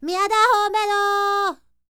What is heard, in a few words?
Vocal Planet World Voices, NOMADIC